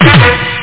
oz brass D

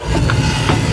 Refill; Beam Breakers

